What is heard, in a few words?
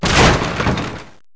break wood